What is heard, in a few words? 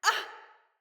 Pain Female; Battle; Envoy